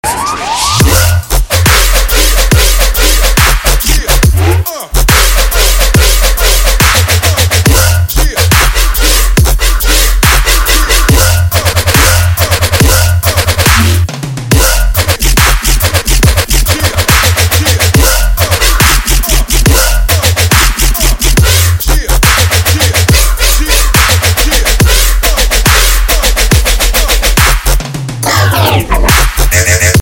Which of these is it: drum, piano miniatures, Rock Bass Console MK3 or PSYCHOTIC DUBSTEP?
PSYCHOTIC DUBSTEP